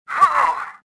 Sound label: gasp; swamp